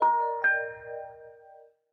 Notification; L18VC Classic US Celular; US Cellular

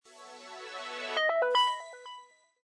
Power On; LG9250 Ellipse

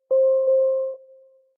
call waiting; ZTE nubia Z20

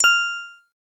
doremi star; SKY IM-A770K-VEGA-Racer; keytone

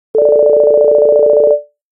Low, Ringtones; Nokia; 3600 slide

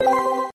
Shutter Sound; LG GT350i Wink Plus; System sounds

